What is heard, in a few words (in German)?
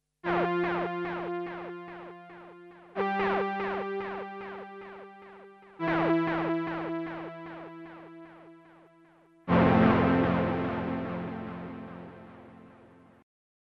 Modulation Auf FM Amount; virusc